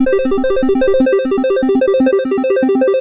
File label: Big Win Music Tally Loop